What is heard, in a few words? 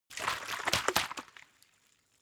bottle water shake short; berufe